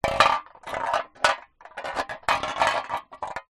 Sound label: drink can empty movement roll concrete ground